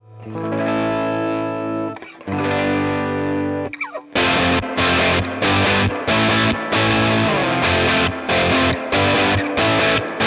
AWE Verb, Swart STR-Tweed

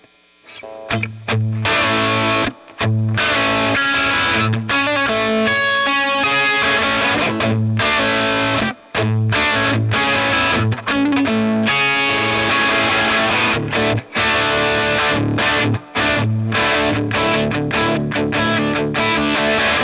divine verb, Swart STR-Tweed; Divine Sample - ReVerb

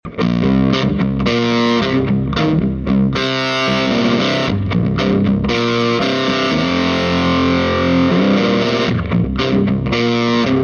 Bari Grind, Swart Super Space Tone 30

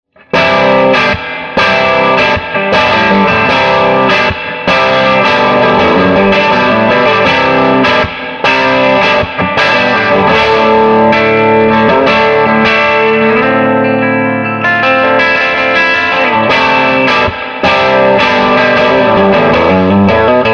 SG light crunch; swart ast pro

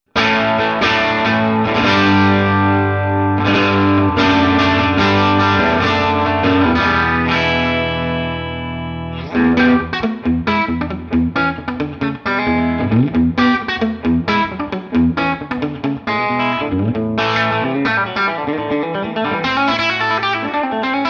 Tele Clean; super 30

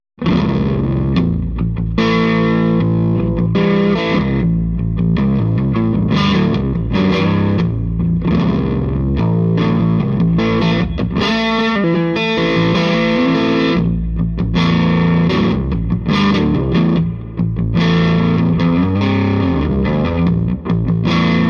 EL34 Baritone Grind; Swart 30 EL34; Monster Baritone-Grind; super 30

